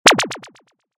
キャンセル

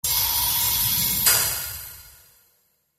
氷魔法 大; 魔法効果音

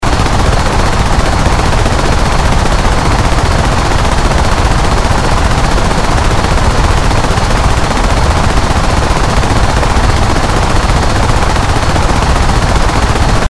戦車が走る音 長め; モノラル; 武器効果音

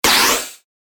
扉を開ける 近未来 モノラル

